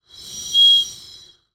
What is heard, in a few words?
Birdwhistle, clay, painted, dry, airy, wispy, swell, smooth, med strong; Eiravaein Works; Vaeyan IV